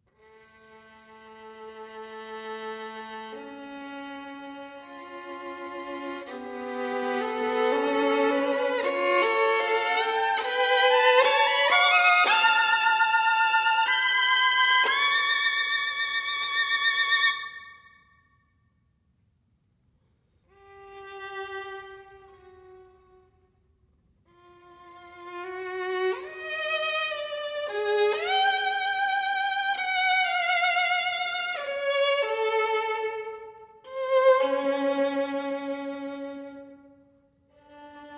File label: Sonatas For Violin Solo, Sonata No. 3 in D Minor Ballade; Ysaye; Op. 27